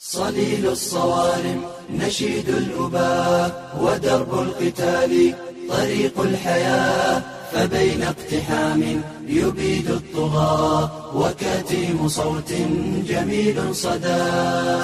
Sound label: goal music